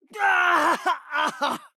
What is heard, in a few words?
Dying sounds; Lúcio; overwatch